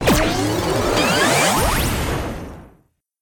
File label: large Win; netent; whenpigsfly